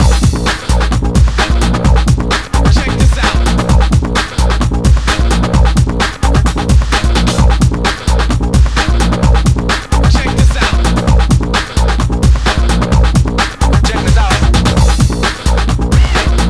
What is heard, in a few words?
Pluck; Jet Grind Radio; Dreamcast